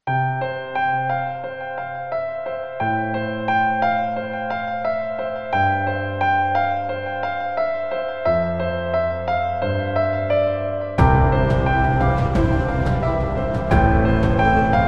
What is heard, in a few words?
Piano Wedding; wedding music